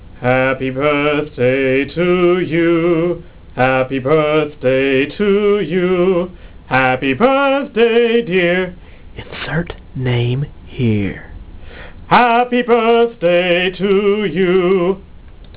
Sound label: happy